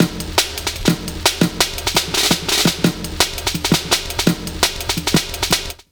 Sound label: LOOP, Explosive Jungle